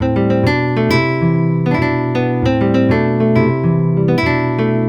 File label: Nylon Guitar; Sonic Foundry (Sony Creative Software); Mac Money; Guitars